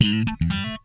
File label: FAZE PLUK, Funk Guitar; 90 s Sample CDs; Zero G